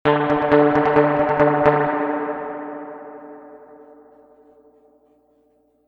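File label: reverb tank 2 spring long staccato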